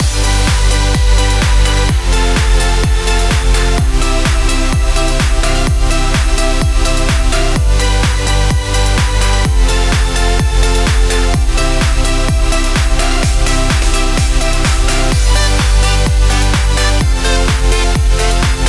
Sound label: positive pop loop